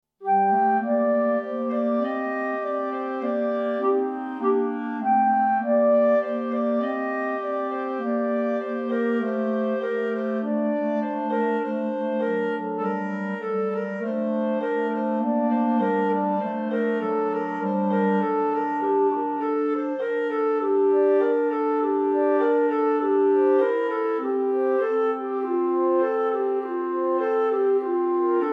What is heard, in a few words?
bourree